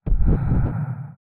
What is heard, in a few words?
Swipes; Electa Quinney